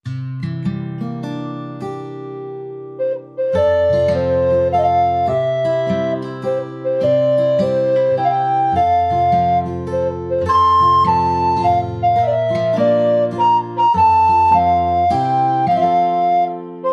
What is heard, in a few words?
Recorder Happy Birthday